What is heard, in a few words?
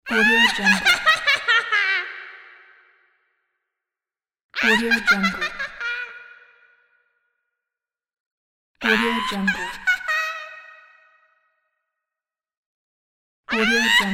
witch cackle laughter